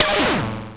Cwejman S1 Hioke

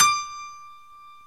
GRAND PIANO; OMI - Universe of Sounds

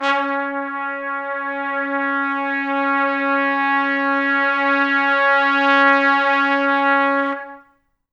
C#3 -R, Pop Brass; Best Service; ProSamples vol.19; AKAI

